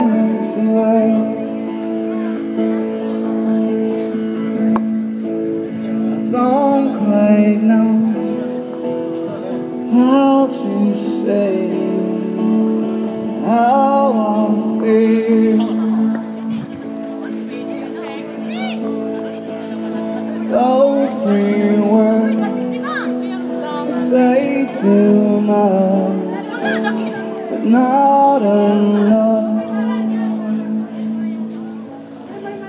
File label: busker sings; cambridge